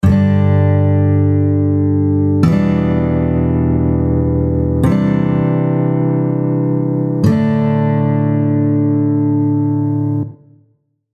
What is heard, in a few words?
progression, guitar